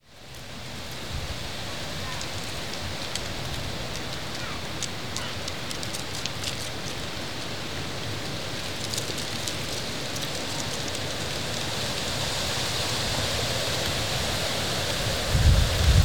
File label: Wind leaves rustling on street